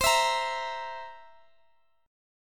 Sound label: C 7th C, Guitar Open D, chord